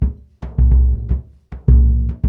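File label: 108 bpm, ethno drums, low drums; Spectrasonics - Supreme Beats - World Dance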